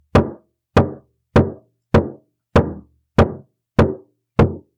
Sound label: Rocks And Rods